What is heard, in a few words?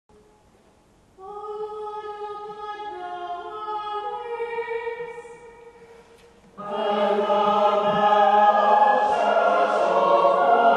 Mixolydian Preces, evensong; Webster; Evensong in Durham Cathedral